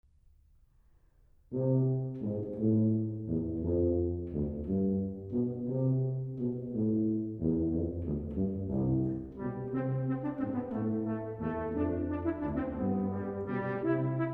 We Wish You A Merry Christmas, Brass Ensembles, Christmas; arr. T. Parriott